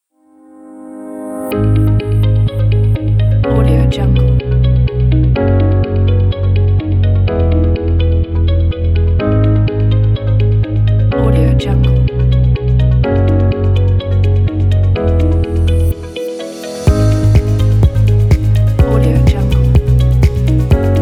ambient corporate tech; risingsunprod

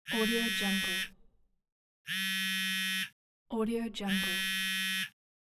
mobile phone vibration

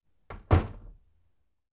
half glass door

